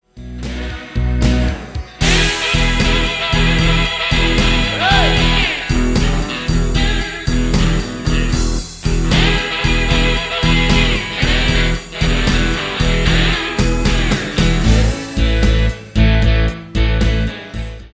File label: Open E Slide